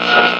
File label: tv static